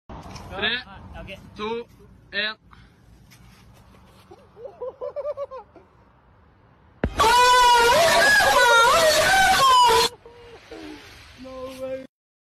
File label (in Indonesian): sound kematian